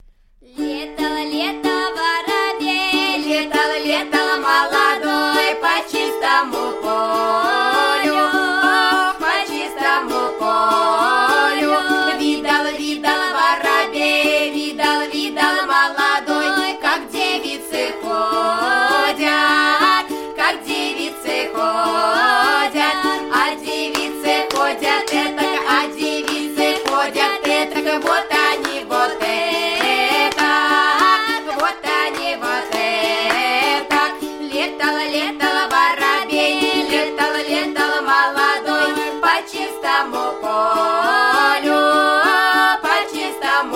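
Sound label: song; igrovaya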